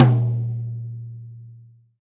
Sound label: Tom Hardest; hydrogen drum samples